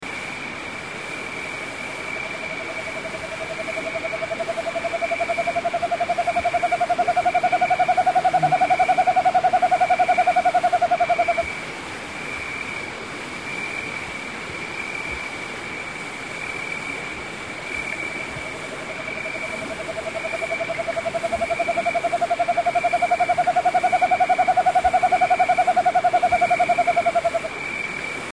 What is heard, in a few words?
Montane-forest Screech-Owl; S. America